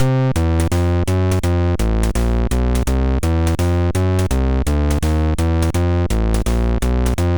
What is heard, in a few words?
Blues, MOS6581R3; Spike Super Blues Experience; hvsc